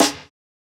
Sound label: Snare, JUNGLE BREAKS